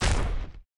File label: Raised Gladiator move